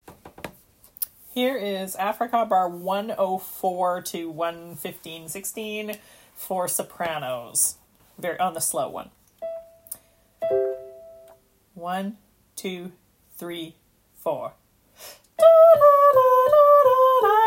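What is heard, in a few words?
slow soprano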